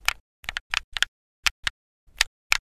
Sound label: Video game controller analog sticks